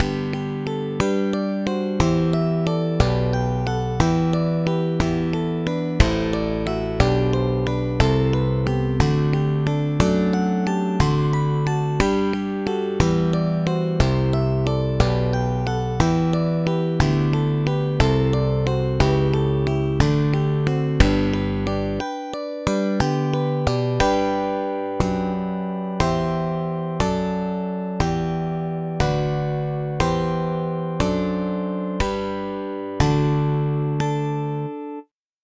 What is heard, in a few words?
slap bass